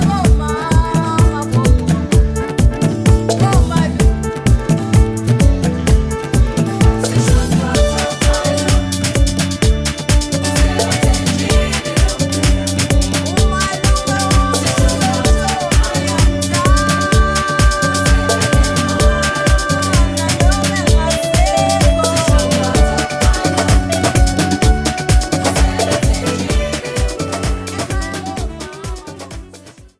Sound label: bass voc mix